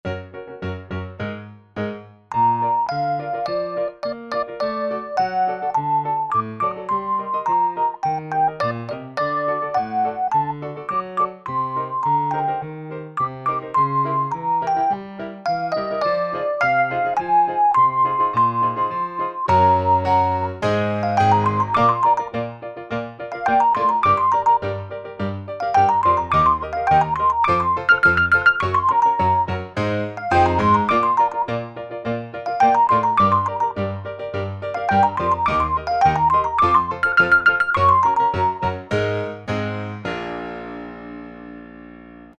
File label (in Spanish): REFE PIANO; tono 4 piano solo; el mago de oz